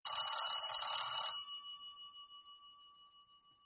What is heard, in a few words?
Tring Tring, ringtone